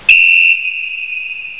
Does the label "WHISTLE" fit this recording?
Yes